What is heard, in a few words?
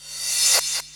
Cymbals Reverse Crash; Vengeance Samples